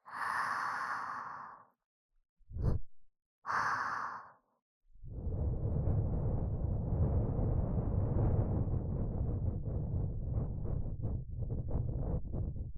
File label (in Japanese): 【右】耳ふー, 耳かき, ASMR; 耳かき小分けトラック